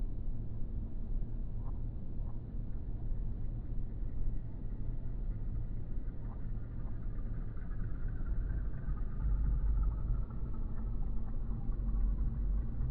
water treatment ambience

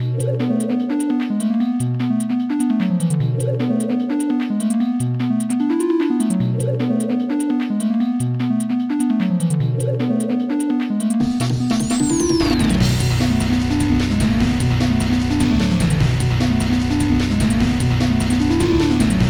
race; Airace; DSiWare

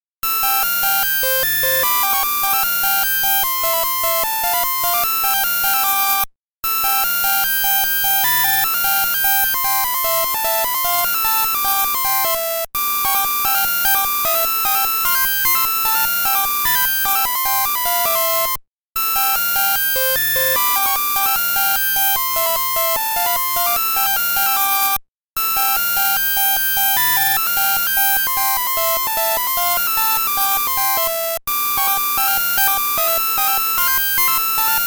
Unused Music, msx; Athena

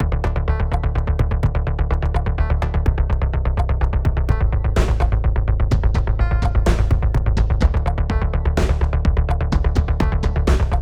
fisher battle

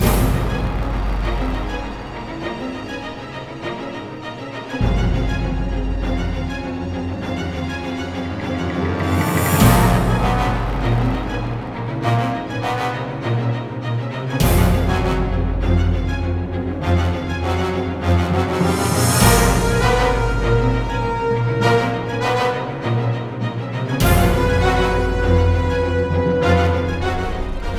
Spooky Combat; Shrek 2; GC PS2 Windows Xbox

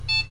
beep

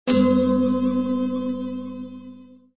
Level End; pencak silat 2 2002 gamerip online

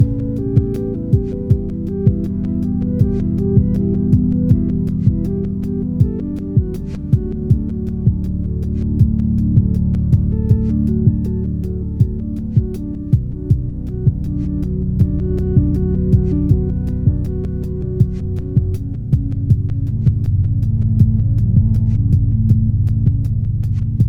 Cave (neutral); minecraft story mode gamerip 2016; A Block and a Hard Place

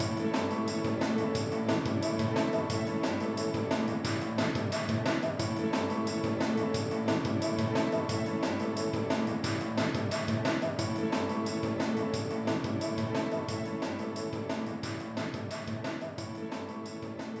BGM Mission Stage Leak; Alterna C03

